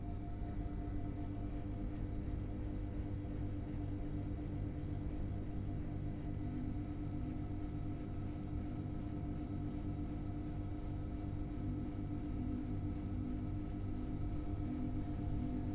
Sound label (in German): Vent hinten